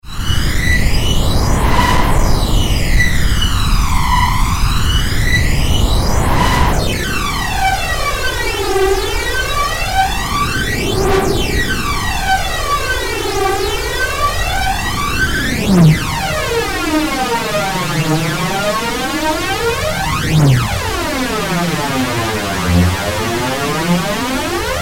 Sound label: flanger